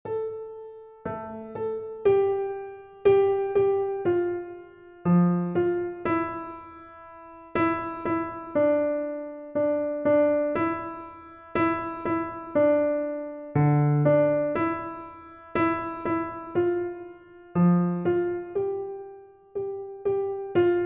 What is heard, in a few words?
a piece for piano